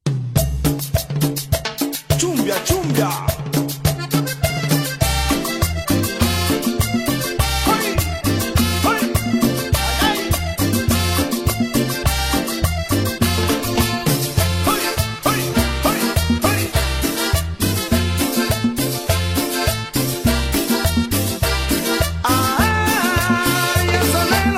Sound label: Cumbia Mix